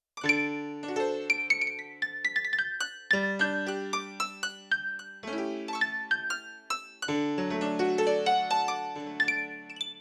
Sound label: 61 Key Grand High; Yamaha TX16W; Yamaha CFIII Grand Piano